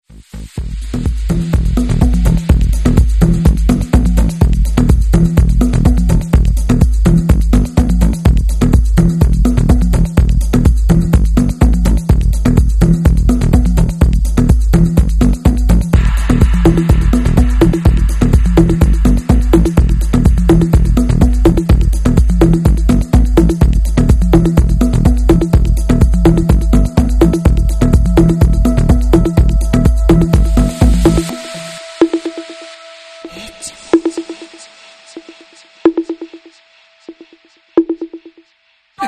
The Flute Dub Mix

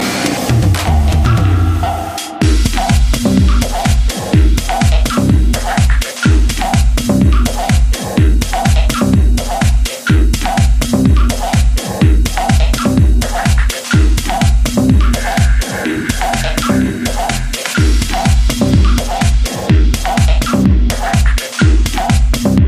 Techno

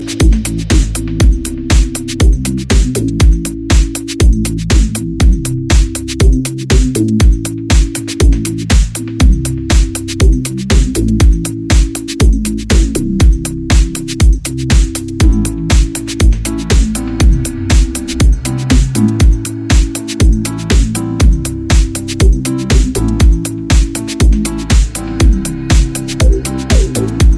Instrumental Mix

